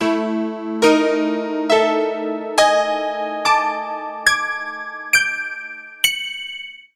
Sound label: slapstick mystery